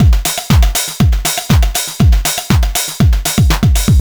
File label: 4 floor beat, trance